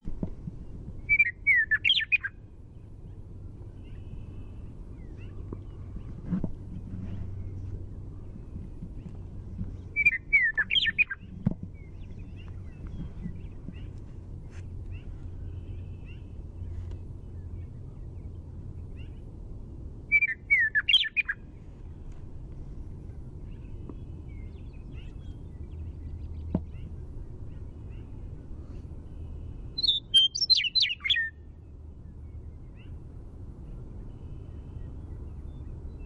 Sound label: Primary Song